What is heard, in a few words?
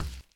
step